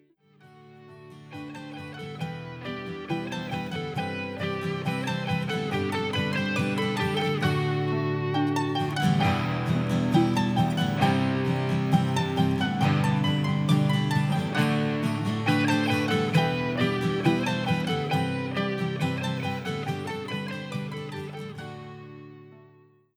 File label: harfa